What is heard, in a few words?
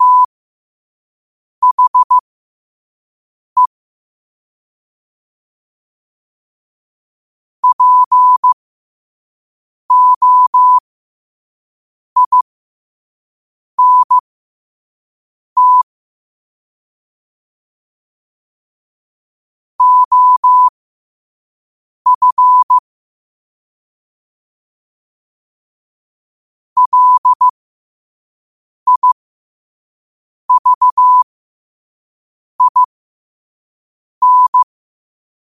5 wpm, morse